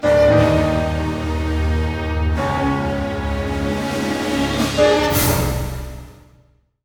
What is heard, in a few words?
FULL ORCA B; East West; Symphonic Adventures